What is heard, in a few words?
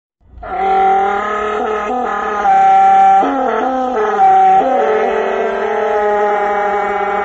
Rawwwwwwgh